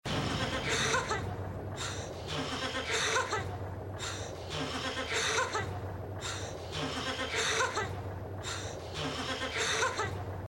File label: looped nervous laugh